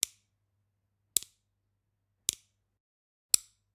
Generic Button Wood Tiny; boom library; The Interface

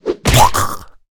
zombie whit; DeadFright

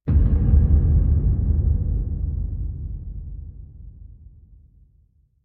Impact Deep Dark Drum Hit - Stronger; Hollywood Edge; sound designer's toolkit v2

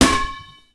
Hit Red Ball Bounce; SFX Kit; Foley - Misc

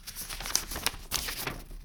paper fast; Apotheorasis

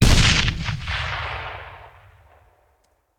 Grenade Hand; Sound Ideas; Guns

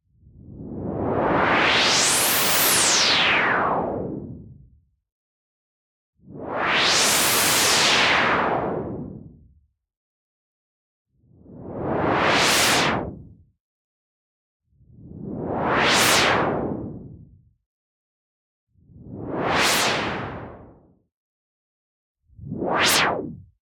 WHOOSH Classic Noise Cutoff Resonance; boom library; Anime Essentials

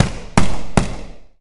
Boxing Slap Stick 10 Seconds

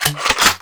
Gun Reload Weapon, Weapons Foley; SFX Kit

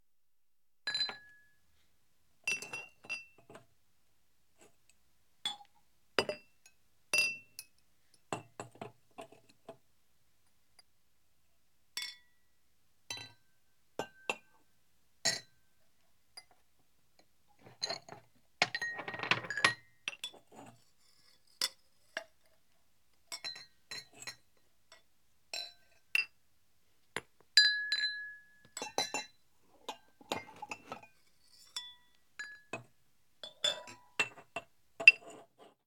Glass Clinks And Motion; Home & Office; 1001 Sound Effects

